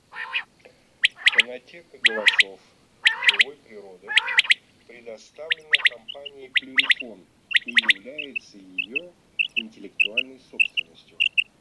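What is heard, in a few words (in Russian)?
Перепел Quaglia, chip mini для манка; plurifon; chip mini 20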